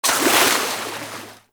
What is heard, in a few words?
Water Sprayer Shot; Warframe; Weapons